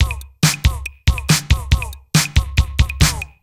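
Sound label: DRUMLOOP ELECTRO 140; Techno2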